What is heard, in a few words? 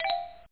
Msg Sent; PSION CD 2; nICQ; Psion Voice